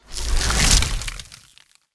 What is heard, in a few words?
frost charge big